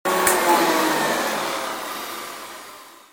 Aspirateur arrêt, bruitage electro-menager

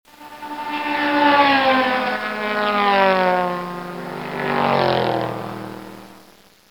Course de voiture, bruitage Transports

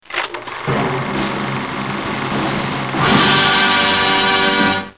Musique fin numéro cirque; bruitage

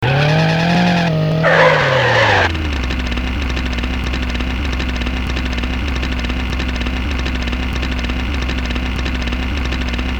Tournage + ralenti, Transports

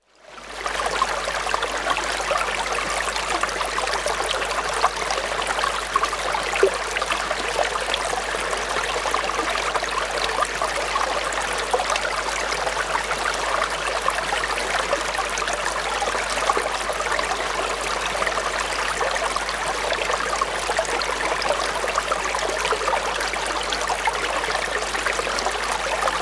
La rivière; bruitage; Liquides

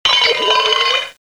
Sifflet dring

